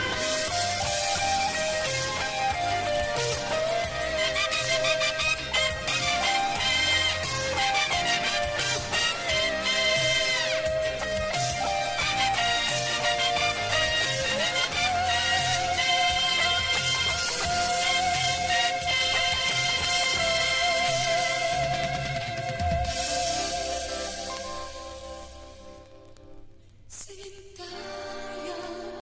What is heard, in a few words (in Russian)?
gitara